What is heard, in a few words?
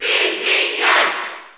Cheer Spanish; SSBU